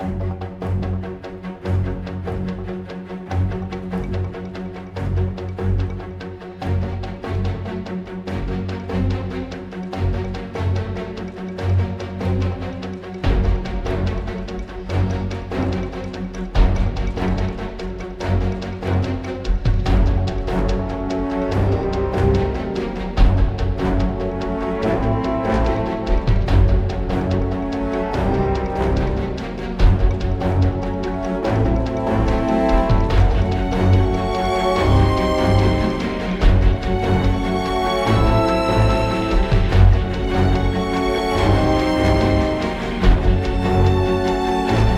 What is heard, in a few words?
Combat Med Threat Subdued